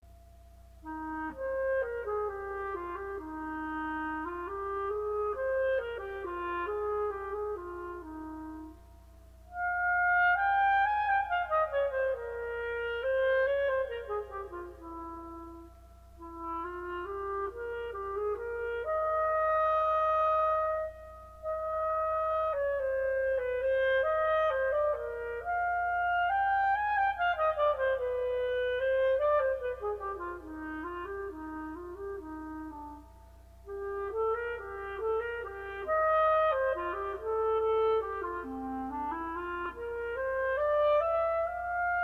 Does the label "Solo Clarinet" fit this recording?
Yes